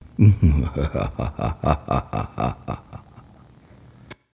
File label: Male Evil Laugh Vampire Dracula Horror Sci Fi Macabre Shudder Zombie